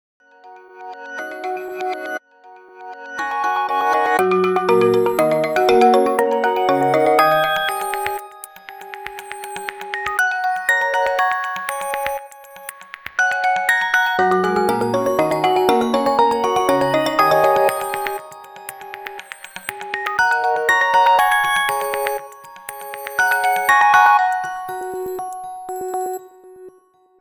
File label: alarms; Foxglove; HTC One M8